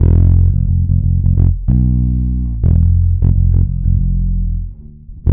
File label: F, bass; BRONX; Spectrasonics; Bass Legends